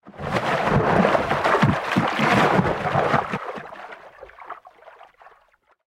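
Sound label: splash from passing huge fish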